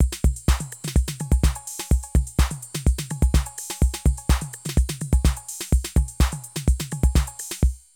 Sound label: Drum loops, Euro techno